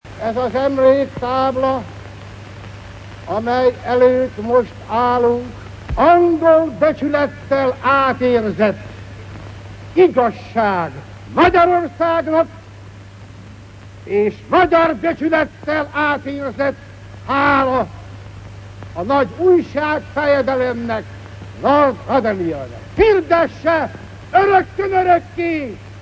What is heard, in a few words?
tabla; hang